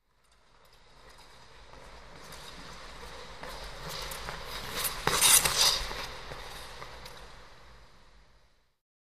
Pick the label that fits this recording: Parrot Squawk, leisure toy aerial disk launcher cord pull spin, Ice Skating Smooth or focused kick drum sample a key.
Ice Skating Smooth